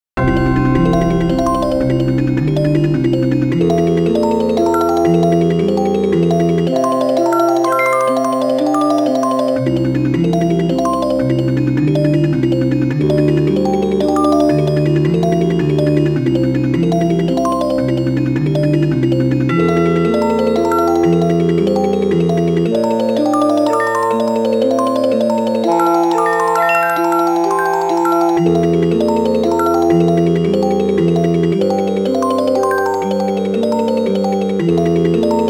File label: slendro without detuned octaves, fractal music